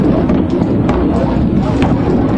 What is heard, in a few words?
Coconut Roll; Ruins